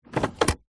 vehicles car gear stick automatic movement set gear toyota rav4